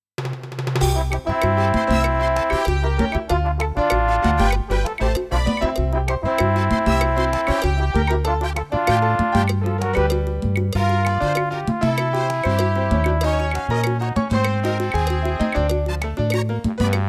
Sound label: SC880